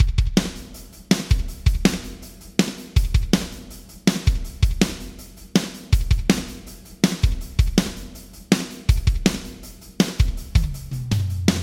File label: Drums Yamaha ProR3 Symphonic Rev 1 Vintage Digital